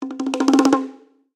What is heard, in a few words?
quick spin enabled; serengeti kings